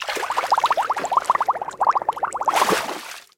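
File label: bubbling of thermal spring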